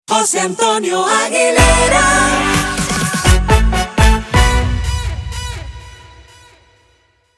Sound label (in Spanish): Vocal